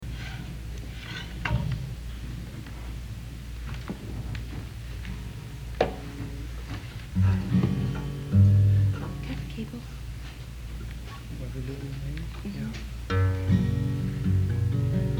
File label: Applause